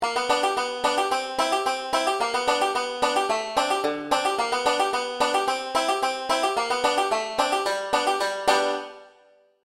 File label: boil cabbage hammer sans melody, banjo; beginning 5 string